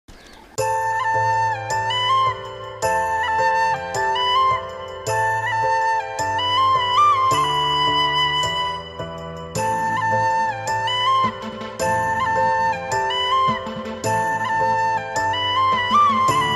love bgm ringtone south indian bgm ringtone tamil ringtone; famous tamil bgm tone